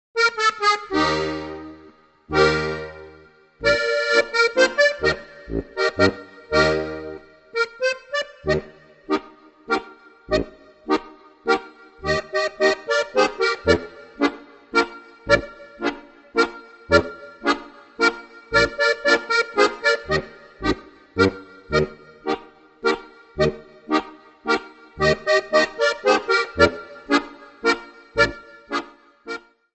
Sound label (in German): plattler